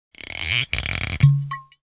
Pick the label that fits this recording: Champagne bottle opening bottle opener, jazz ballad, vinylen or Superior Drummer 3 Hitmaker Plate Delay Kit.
Champagne bottle opening bottle opener